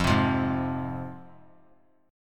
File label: F Minor F down; Fm strummed; JGuitar